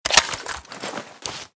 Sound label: mag out, 9mm AR; weapons; halflife